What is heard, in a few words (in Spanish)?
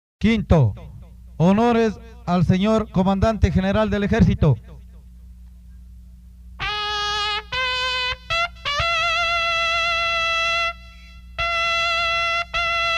honores cmte. ejercito, toques de trompeta de caballeria; himnos de caballeria